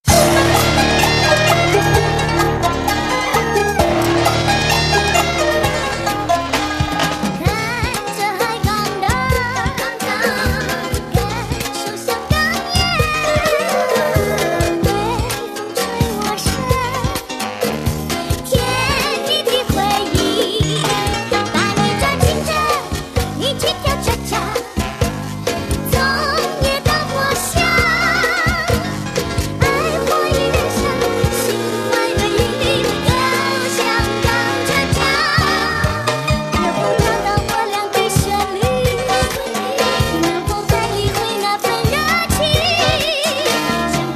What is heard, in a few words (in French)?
Musique; 2em Degre; Niveau B